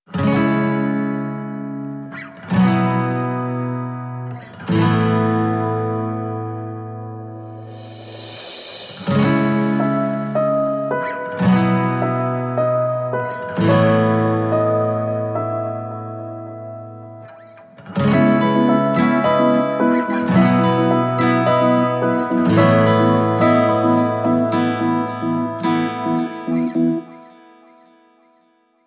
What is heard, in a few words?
Bright Acoustic Days, No Drums; 30sec Version B